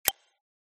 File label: click